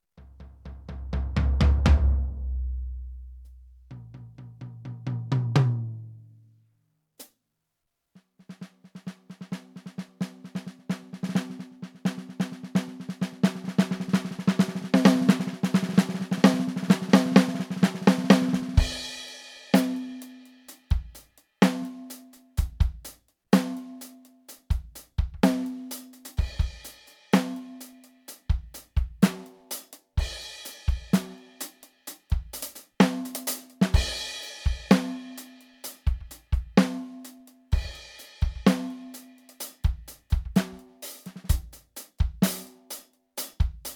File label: EFNOTE 7 Kit Vintage Power